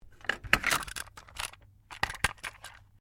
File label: Cassette tape handle; Tape Player Sound Effects; Tape Players (Sound Effects:Electronics)